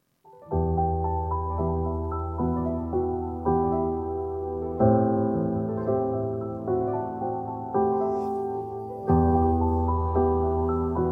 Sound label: piano Version